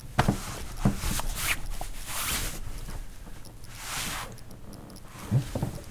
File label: Movement Hands On Fabric